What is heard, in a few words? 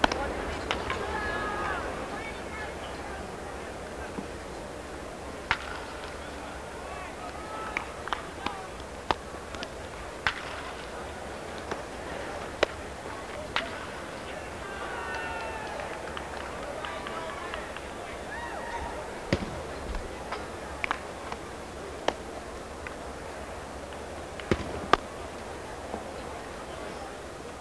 catch, baseball; sports